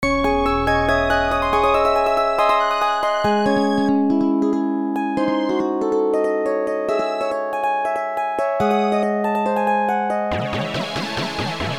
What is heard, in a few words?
techno